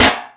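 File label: bouncy metal trash hold hit; bouncyobjects; Tony Hawk's Project 8; XBOX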